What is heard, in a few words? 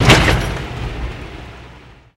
Car Suspension Bump R; hits & rattles